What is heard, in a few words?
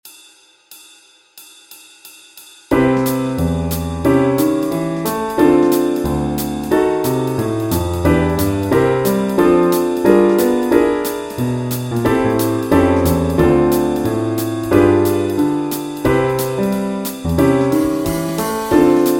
Backing Track, walking bass